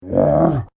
Giant Goblin, monster sound effect; fantasy sound effect

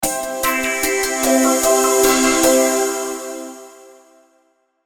Logo Short Beat Soft Reverb; Sonic Logo; Blastwave

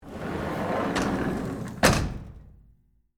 Cabinet Drawer Close, Metal; Theatre, Gels; BlastWave

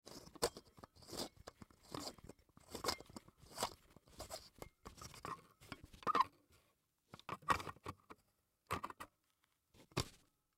Flood Light Bulb Screw In Socket Slow; Lights; Foley; Blastwave

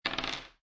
Dice Throw One Die On Wood Table; Casinos; Lynne Music

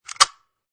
Lock, Combination lock unlocks; Locks (Sound Effects:Objects)

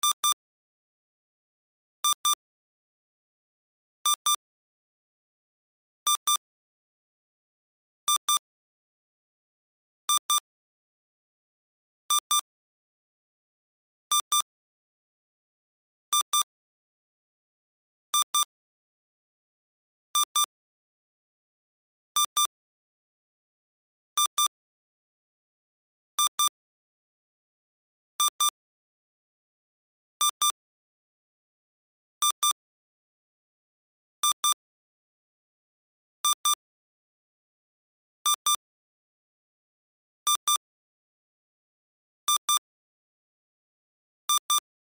Life Support Machine Beeps Loop; Hospital; Emergency

